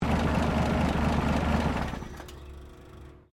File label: CAR HOLDEN FJ SHUTDOWN BONET OPEN, Classic Australian cars